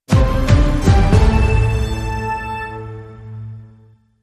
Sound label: Logo Only